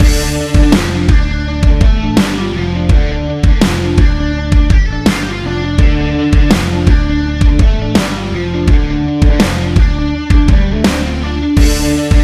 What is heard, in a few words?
tension; By Burak Sound Bank; tension gerilim vol 23